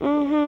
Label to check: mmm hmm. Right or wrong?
Right